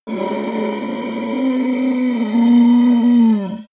yeti groan painful; kreaturen

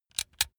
razor extend comb double; maschinen; rasierer

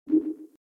non tonal

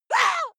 female lunatic shout frightened short; schreie; menschen

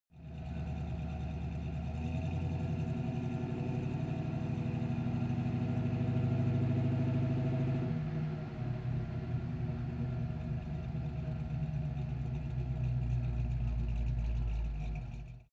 car camaro accelerate decelerate exhaust

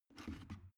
plastic water boiler lift up

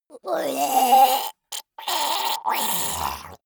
monster die gurgling high; kreaturen

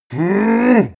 male giant charge; menschen; schreie

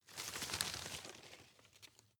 rustle medium; foerster

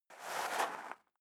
step leather shoes gravel light scuff; schritte

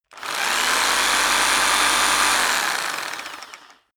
rock drill hammer rev very long; maschinen; bohrer; soundpool

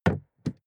suv gas cap close; verkehr transport; autos

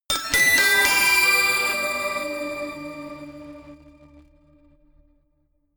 notification glockenspiel crazy sequence jump; instrumente

